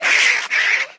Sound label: Metroid Vox